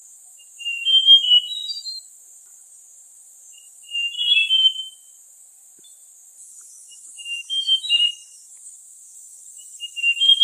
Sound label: White Tailed Robin calls Gunung Brinchang Cameron Highlands Malaysia